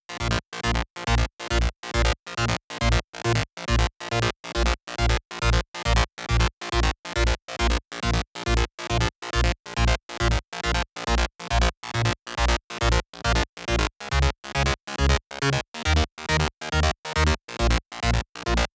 Bassline 138BPM, A#minor; Vengeance Samples; Fusion Kit

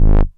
Bass Multi Rev G#; Vengeance Samples

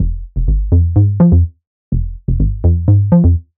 125BPM Minimal Melody; VFH1 125BPM Minimalsau Kit

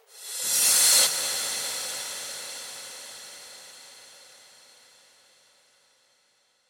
Cymbals Reverse Crash; Vengeance Samples

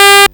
Arcade Riffs

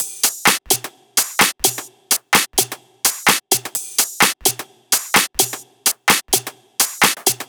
128BPM Drums; Vengeance Samples; Hopper Kit

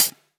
Closed Hihat; Cymbals; Vengeance Samples

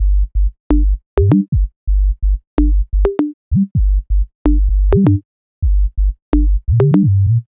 Melody Kits 128BPM Root G; Vengeance Samples

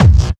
Bassdrums Clubby Kicks; Vengeance Samples

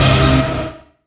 Synths C; vengeance essential club sounds-3

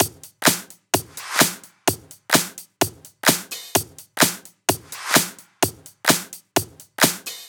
128BPM Drums; Vengeance Samples; Knight Kit

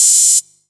Open Hats, Cymbals

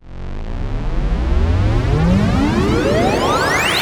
Sweeps Medium

